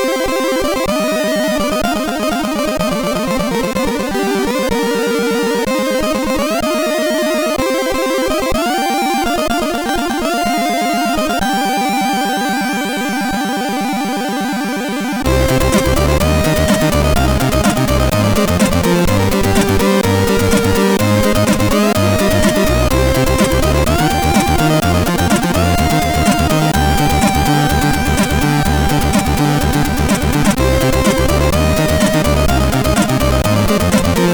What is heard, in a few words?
Rythm, sid CSG8580R5; HVSC